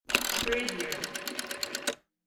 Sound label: rotary phone